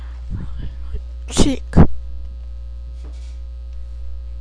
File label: chick